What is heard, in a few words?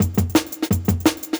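Jungle Breaks, 170 bpm; Happy; Original Jungle Breaks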